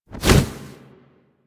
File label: Bird Of Prey Wing Flap Down; Warframe